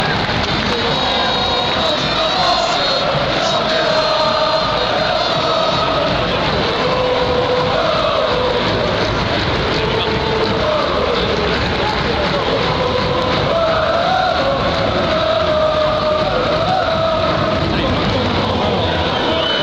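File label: chanter la gloire des lensois, football chants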